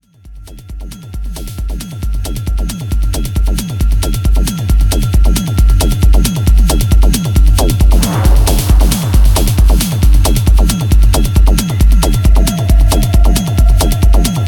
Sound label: Echo Drone